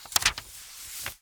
Book Page Turn; 1001 Sound Effects

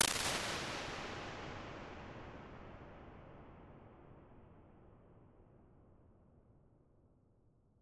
BIG HALL, impulse responses

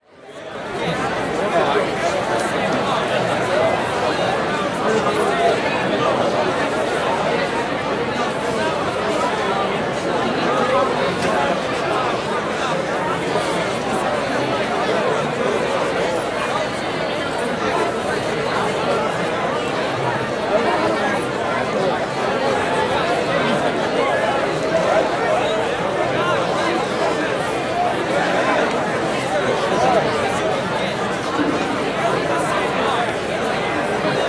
Crowd Outdoor Busy Marketplace, Walla; Sound Ideas; Walla Series

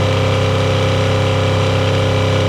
65 Corvette Loop; Automobiles

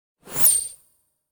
Button Thin Turn On Off Button; Hollywood Edge; sound designer's toolkit v2

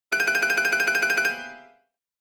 Comedy Piano Trill Danger; Hollywood Edge; sound designer's toolkit v2

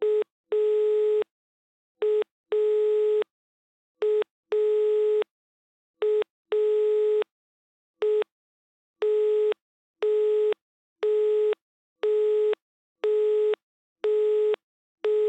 phone dial tone, dial tone, busy signal; devices accessories utilities; NS Studios - ultra sound collection

